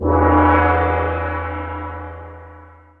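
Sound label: orchestra gong; Greytsounds VOL-1; ORCH PERC 2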